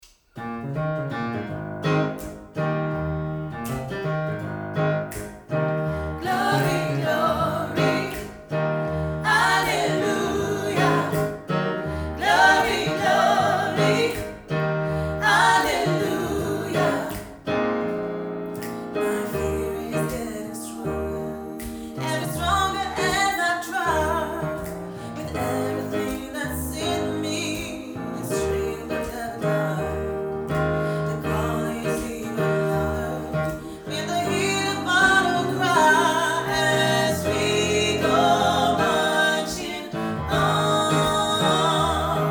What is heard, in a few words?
Glory Hallelujah